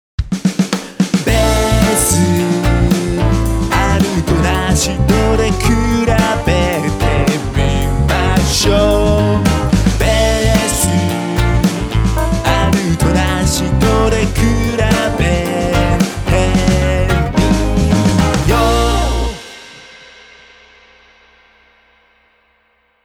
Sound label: bass